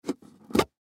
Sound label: Gift Box Lid Open, Gift Box Lid Remove; Lids; Foley; Lid Sound Effects; Objects; Blastwave